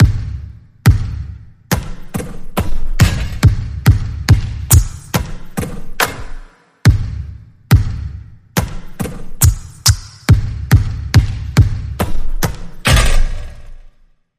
Hoop Hustle Rhythm

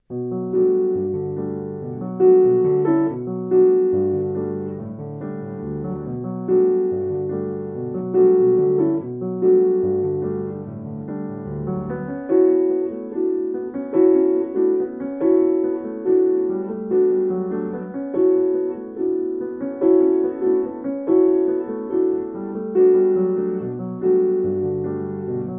Solo Piano

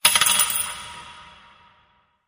Organ Jar Coins Dropping

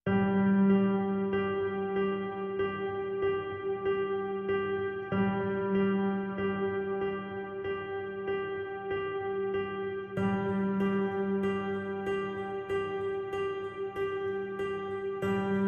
Persistent Piano G

